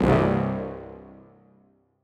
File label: Horn (C)